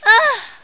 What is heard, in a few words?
Ahhh; Riviera; Serene